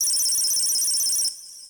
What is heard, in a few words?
SPACE FX 140; SpaceSounds